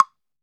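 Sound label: wood block, GM percussion kit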